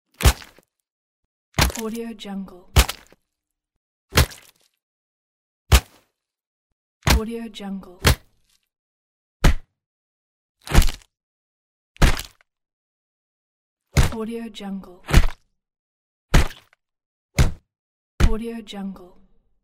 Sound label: boxing punch pack; boxing punch pack 2; tibasfx